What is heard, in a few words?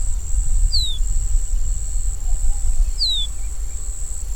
Sporophila bouvreuil call